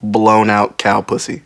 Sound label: Cow, Vox